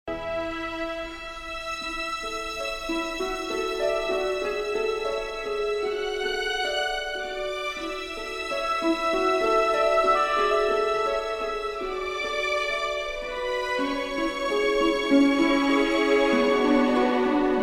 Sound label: Music